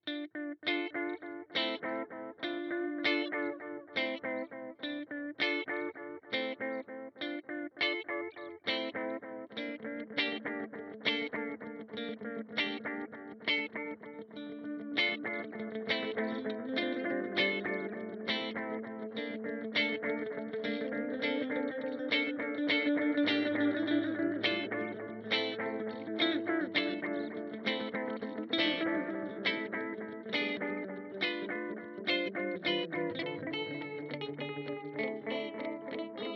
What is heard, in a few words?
Seymour Duncan Vapor Trail Modulation